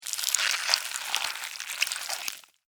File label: Worm, Crawl, Movement, Slimy, Wet, Insect, Bug; Blastwave; Animals:Insects